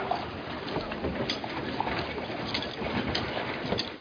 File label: house mid